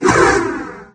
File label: hovercraft nitro; There; ThereClient